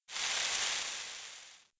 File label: Pour Dust; Playable Tutorial Level